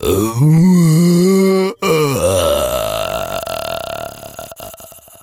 zombie die

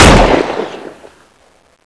fire, weapons; tfa cso

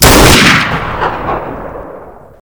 barret50; m82; weapons

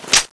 scar clip in; cso; scar oz

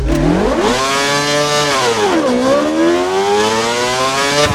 first, vehicles cars; f1; lwcars

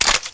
aug clip out; weapons

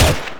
Silenced Five-Seven, weapons; FN Five-seveN

